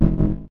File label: generic warn; ui; starruler